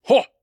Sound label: Attack